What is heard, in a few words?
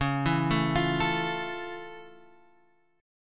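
F Augmented Major 7th D flat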